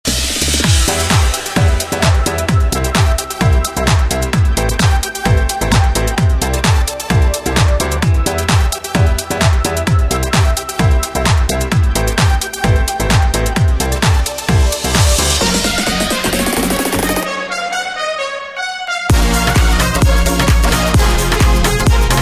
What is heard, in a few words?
Pop EDM Anthems